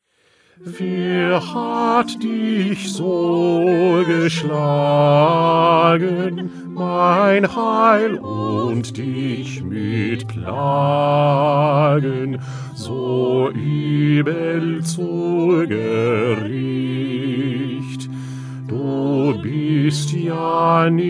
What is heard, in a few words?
Bass; Bach; St Matthew Passion